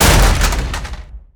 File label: Explo Small Plastic; Destruction